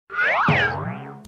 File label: Cartoon Slip Sound